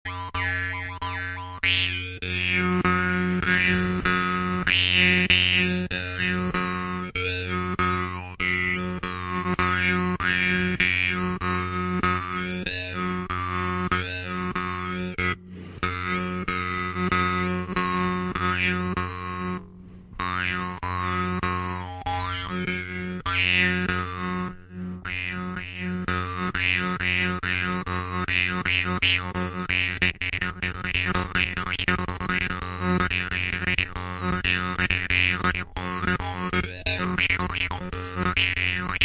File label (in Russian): vargan vargan altaiskii vargan, altajskij komus vargan